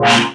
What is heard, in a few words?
stabs; stabs & hits